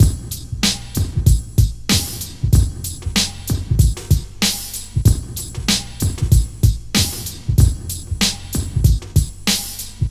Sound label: Beat 095, Hip Hop